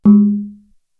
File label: prc g#2, Gamelan, KORG DSS-1